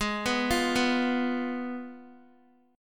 Ab Minor 6th Ab, down, Guitar, 4F, 4A, 5C, 5G, 5C, 6E; Abm6 arpeggiated